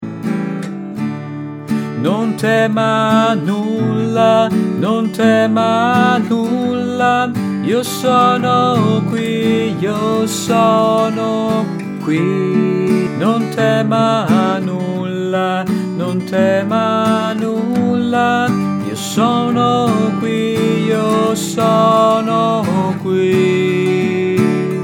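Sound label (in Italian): contralti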